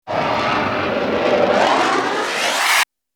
Bullet Time; FXHome; Bullet and Gun

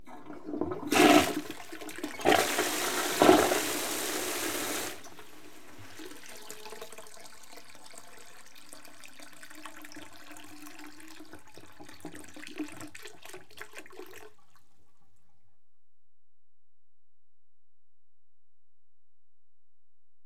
Toilet Flushed; BBC Sound Effects Library; Age Of Steam

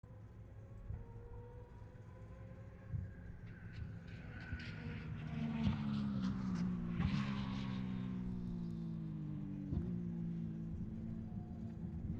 AUTO RACE Porsche 911 GT3 Pit Straight; ec fields

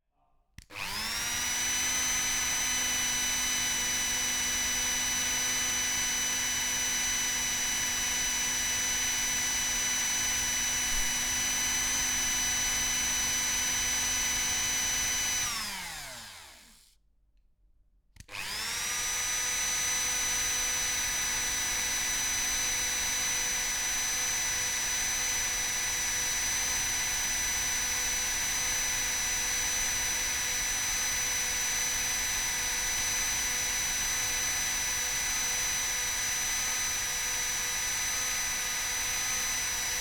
Dremel - Sustain Speed 15; Power Tools; SDC Cardoid Stereo

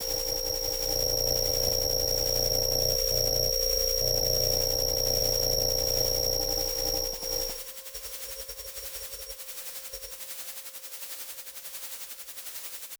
Transmission Apparatus Kyma synthesis; USO

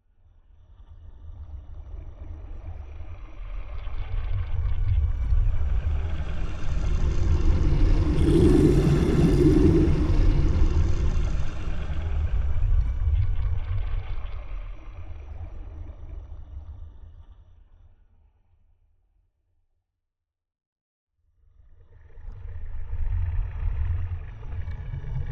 deep bubbling swooshes; The Sound Pack Tree; The Whooshes & Impacts Pack

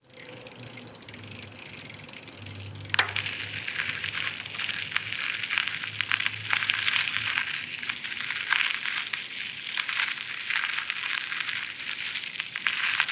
Bicycle, Riding, Gravel, Close, Prespective; RZ Post; The Bicycles SFX

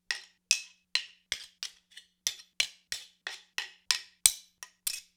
Dagger Axe Blade on Blade, Weapon on Weapon; StillNorthMedia

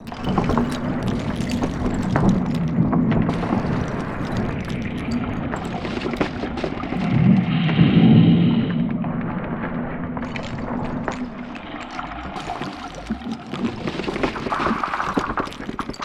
glacier huge shift; Foley Elements Ice and Snow

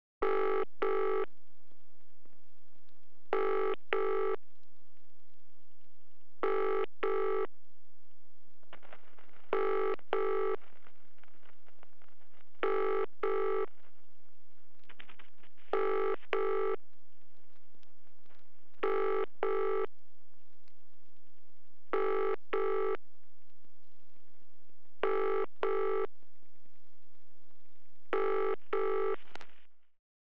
BT Lines AND Tones, BT Ringing; Computers Printers Phones; BBC Sound Effects Library

